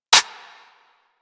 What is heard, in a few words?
Energy Rifle Mech Clip; Warframe; Weapons